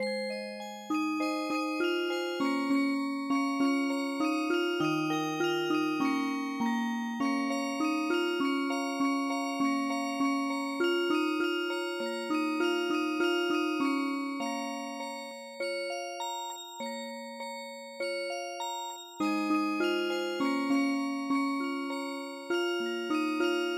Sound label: Music Box